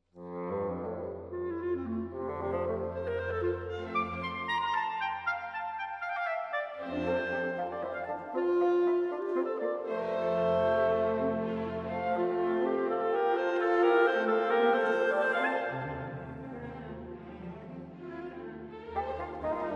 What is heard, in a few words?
Duett Concertino for Clarinet, Bassoon and Orchestra - Mvt. 3. Rondo; Allegro ma non tr